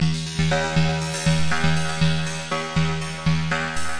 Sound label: flange tunnel synth; SYNTH AND ELECTRONIC LOOPS